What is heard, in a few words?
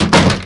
Open; Life of a Naomi